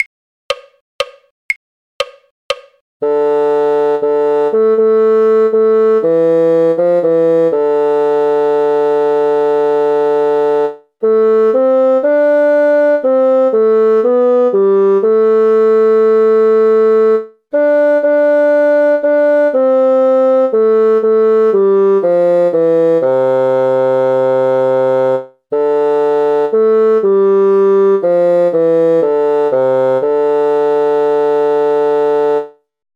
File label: bassoon; scarborough fair; folk